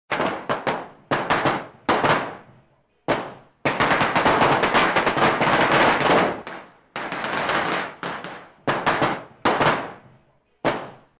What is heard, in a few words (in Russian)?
feyerwerk; noviygod